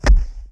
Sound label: Hit; Pochi